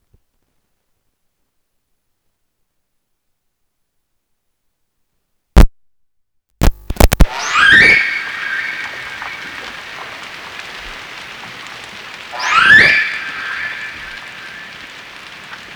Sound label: Orcas